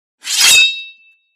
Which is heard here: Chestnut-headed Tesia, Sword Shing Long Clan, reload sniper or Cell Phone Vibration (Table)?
Sword Shing Long Clan